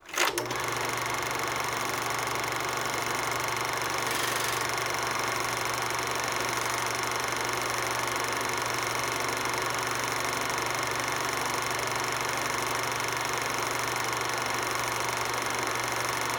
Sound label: Projector 16MM Sequence; Home & Office